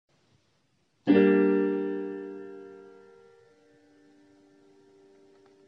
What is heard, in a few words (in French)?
accord sol m